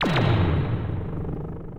ability blinding flash

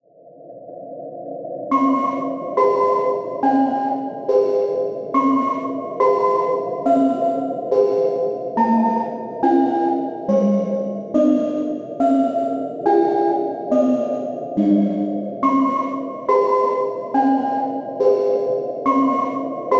one snowy day

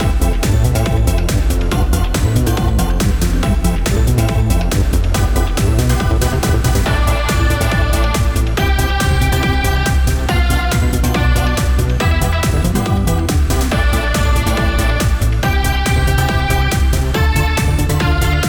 cool highway remake bpm140

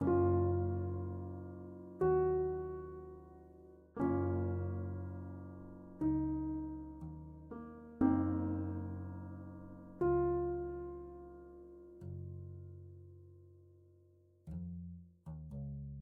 pause menu ost; contemplative fantasy music; on a tous besoin de temps

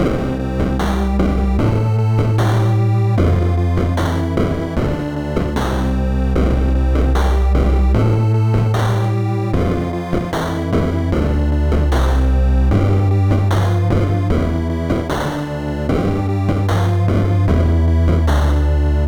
BeepBox Song

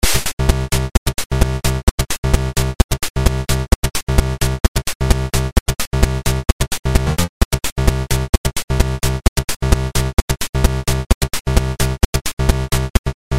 Techno House PSG

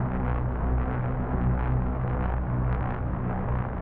plane

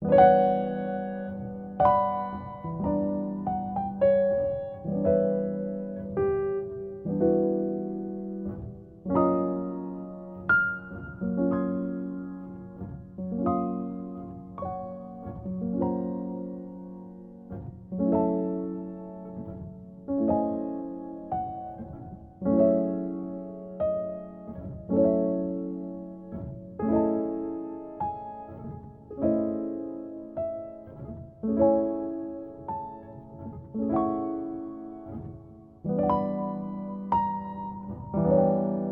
jazz improvisation

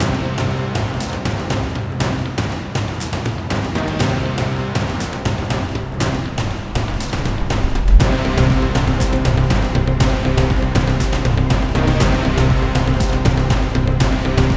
Edgy Epic Trance Loopable 120BPM

